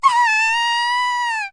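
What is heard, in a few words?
girl scream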